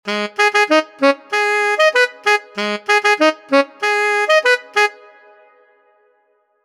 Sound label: sax line